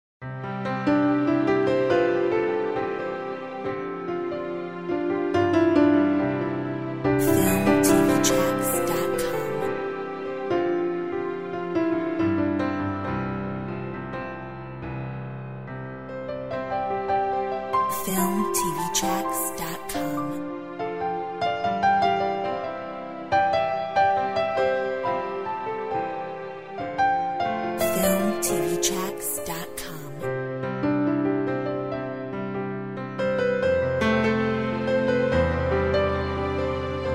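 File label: Orchestra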